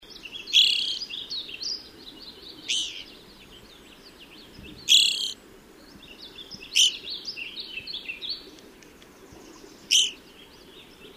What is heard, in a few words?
Evening Grosbeaks